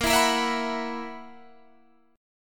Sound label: Gb 11th Bb